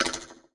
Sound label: menu tick